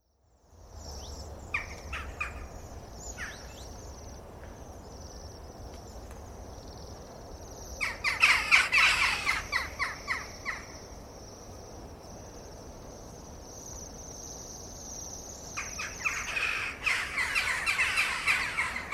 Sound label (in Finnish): naakkaparvi; linnut